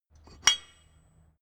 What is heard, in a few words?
metal drawbar handling; industrie-wirtschaft